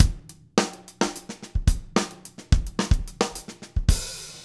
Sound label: 108, drum